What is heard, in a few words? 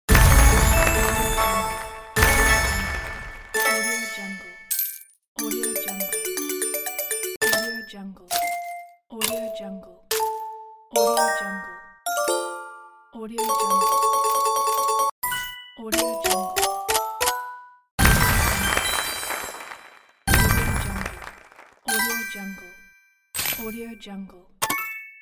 slot machine